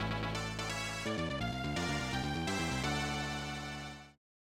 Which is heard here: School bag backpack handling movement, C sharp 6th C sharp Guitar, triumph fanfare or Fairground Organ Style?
triumph fanfare